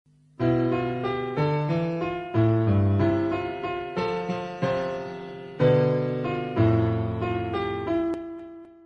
Clouds Lead

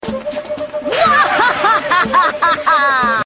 Bwahaha; Rukia

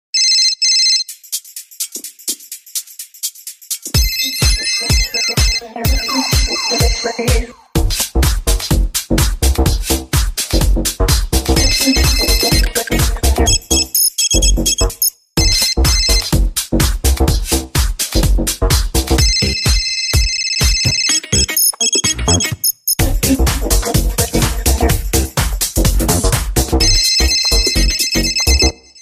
Funky House Ringtone

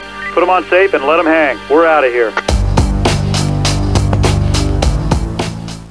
Msg New; Matrix

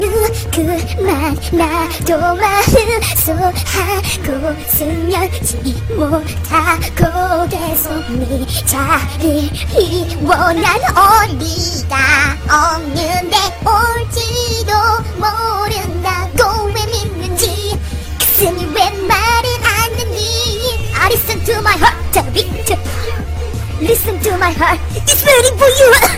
Heartbeat; Fangirl

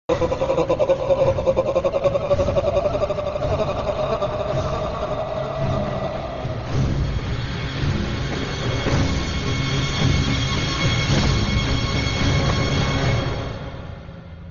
Scary; 1920

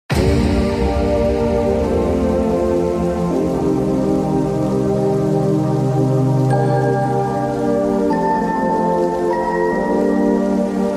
Happy power intro

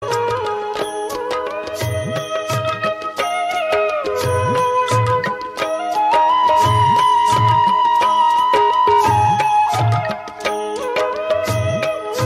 Bansuri

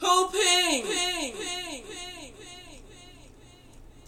WhoPing

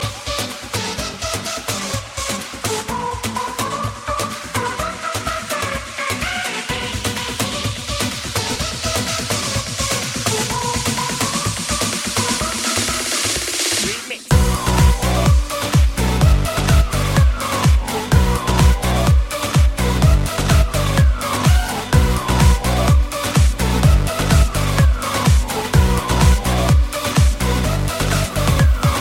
Remix Instrumental

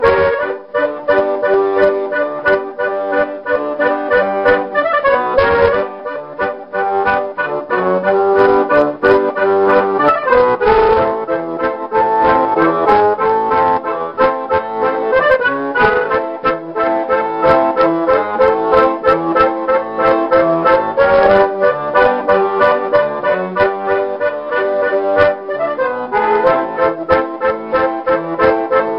greek

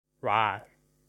Rawr

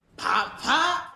Pop Pop

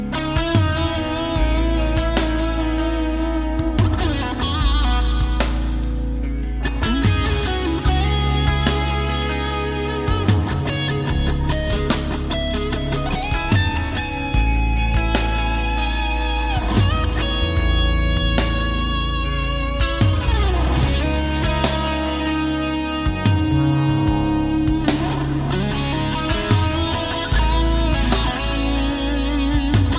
Guitar riff

